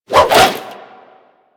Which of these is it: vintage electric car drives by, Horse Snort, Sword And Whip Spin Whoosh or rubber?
Sword And Whip Spin Whoosh